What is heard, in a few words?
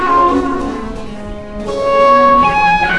soundstream music ss 3kbps